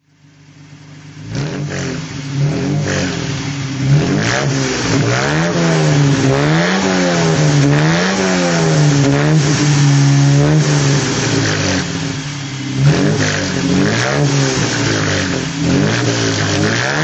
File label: lancia b20gt throt, Lancia Aurelia B20 GT series 6; 1957